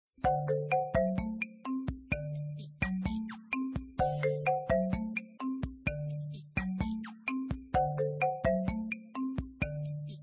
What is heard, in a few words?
bgm